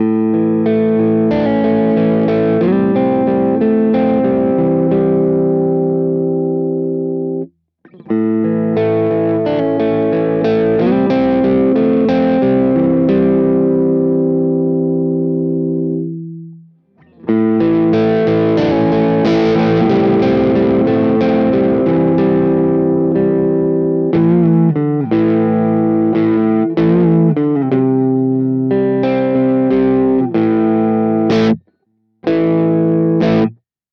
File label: Neck Clean; Cort KX-500